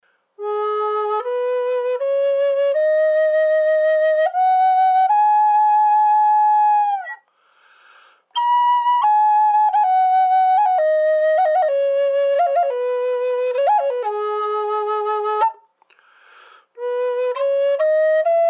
G Sharp Pinyama Dry, Native American style flutes; Curly Pyinma in G#m; Natural "dry" sound of this flute with no enhancement; Laughing Crow

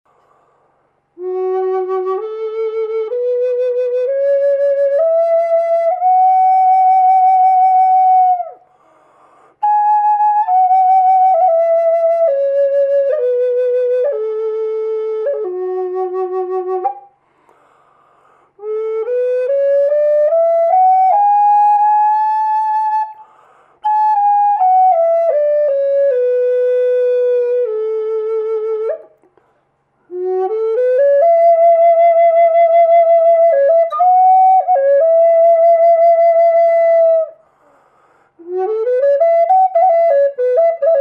F-sharp Dry, flutes; Christmas

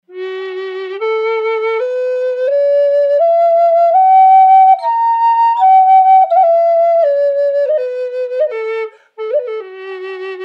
f sharp fat bubinga dry, flutes

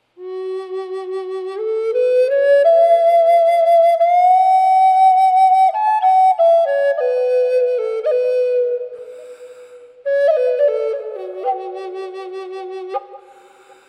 ebony f sharp wet, flutes

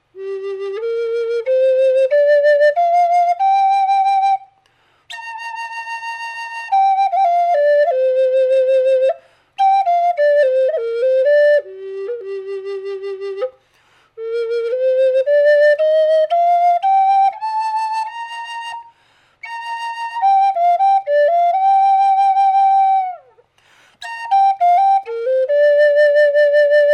colored magnolia g dry, flutes

